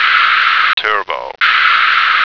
turbo

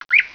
CHIRP

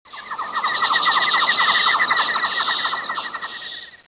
BIRD BYE

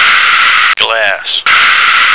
glass

